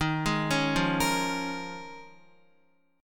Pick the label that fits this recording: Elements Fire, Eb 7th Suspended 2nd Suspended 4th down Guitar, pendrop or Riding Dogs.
Eb 7th Suspended 2nd Suspended 4th down Guitar